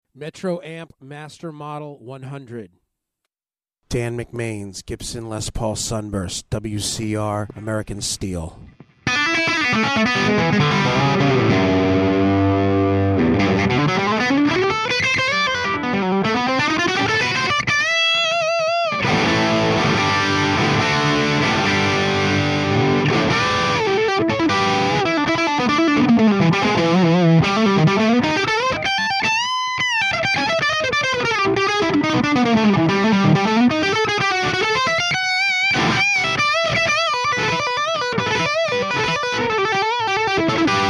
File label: metro amp master model 100 bridge